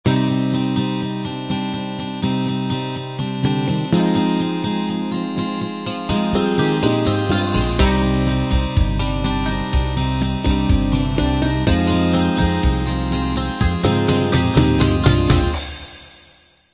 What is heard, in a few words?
light rock intro